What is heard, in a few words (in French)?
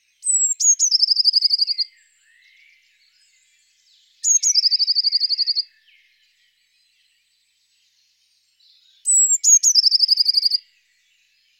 Mesange bleue, chant